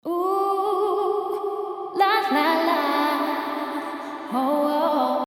clean

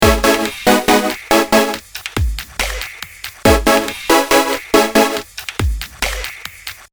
after LFO

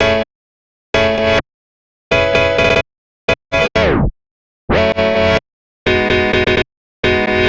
piano chord

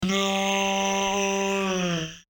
vocal

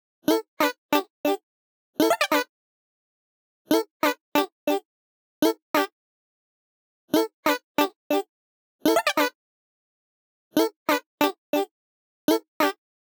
Doubler off

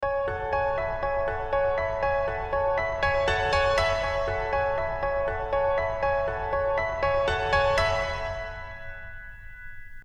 piano

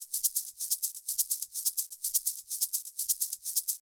Shaker after

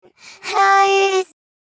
rev vocal

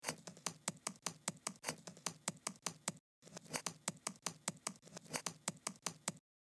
percussion

